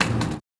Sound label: Skate Park Skateboard, percussion; Jamail Skate Park; Houston